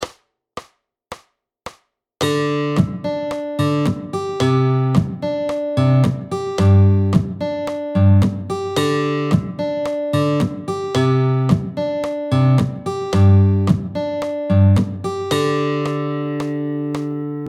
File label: Percussive Fingerstyle, Guitar